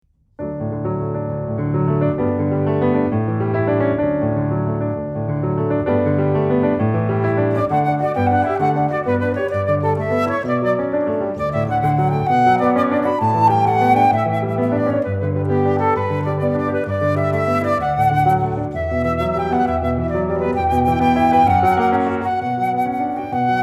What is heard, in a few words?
Gigue Flute and Piano